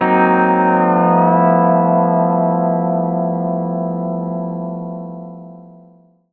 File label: PEDSTL CHORD, Country; G6 9